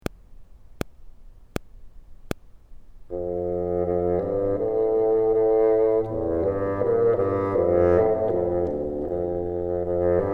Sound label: bottom, bassoon